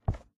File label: close put, book; minecraft